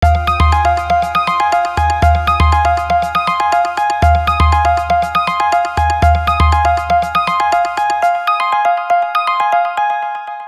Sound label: Rain Drops; PlusFourSix; Alicia; Lars Edvall